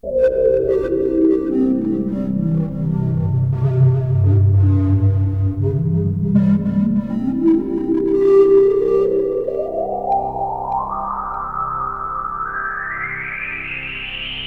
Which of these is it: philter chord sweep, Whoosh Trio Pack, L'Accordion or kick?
philter chord sweep